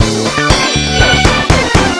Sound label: victory; tf2ware ultimate; music game